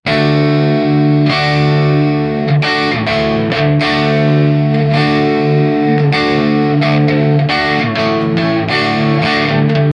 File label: punk rock guitar electric